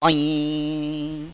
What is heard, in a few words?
oing